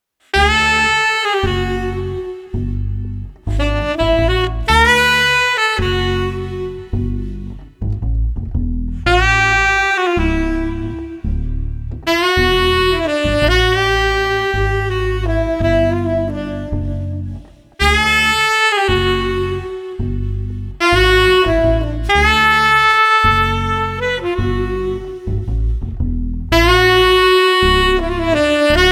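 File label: Street Sax Combo Smooth no Drums, 110 bpm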